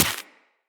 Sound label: Laser Impact Generic; Weapons; Bullet Impacts; Surface